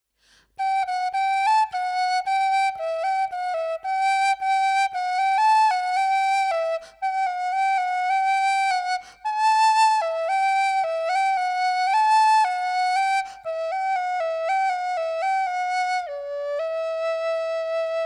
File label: whistle D rosewood